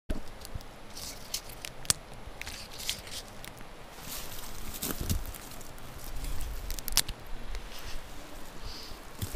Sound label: Hi Hat